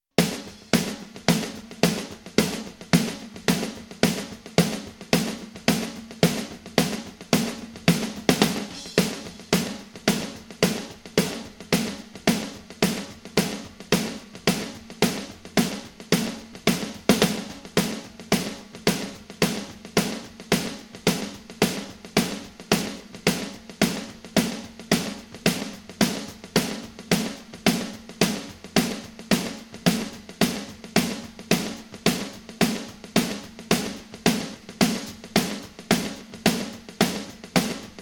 drums

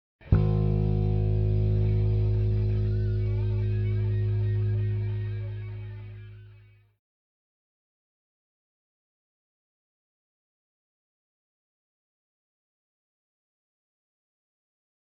rhythm

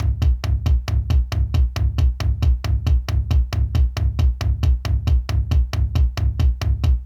Bass Drum Effect, 136; 1B

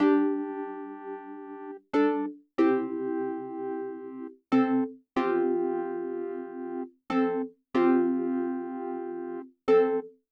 Keys FX; Multitrack

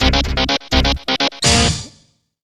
guitar bit

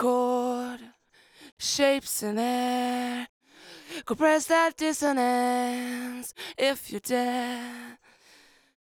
Lead Vocal Verse I; Multitrack

